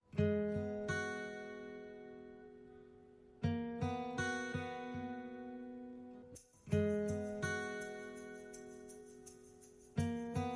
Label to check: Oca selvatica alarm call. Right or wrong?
Wrong